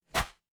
paper fabric cloth whip

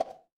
ui pop

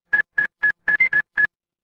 ui timer

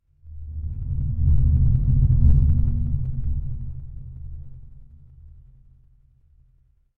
horror ambience dark

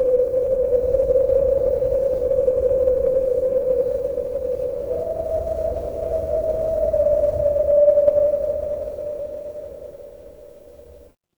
ambience wind

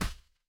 impact slam

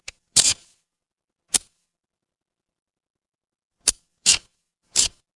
mechanical lever

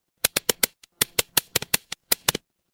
office pen

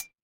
glass clink

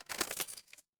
impact crash

